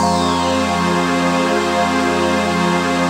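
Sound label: chord pad, Pads; Best Service; XX Large - Pads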